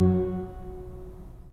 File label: Pipe Mix Celeste, 3D Organs; E-MU Producer Series Vol. 5; 3-D Audio Collection